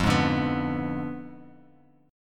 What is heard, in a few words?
F Diminished 7th F down Bass Standard; Fdim7 strummed; JGuitar